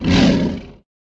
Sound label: ape warrior damage, monster